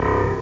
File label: mirage clav; OctaMED SoundStudio V1